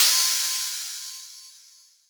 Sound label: trashy, Hats, Odd